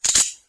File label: cutter